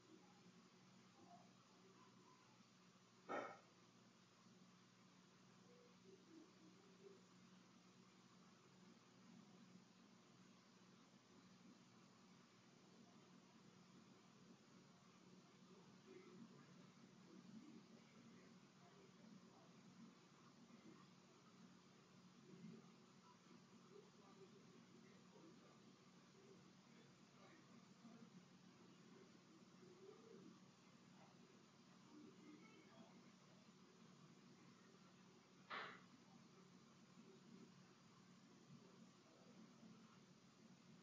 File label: Empty Room; historic rainworth lodge investigation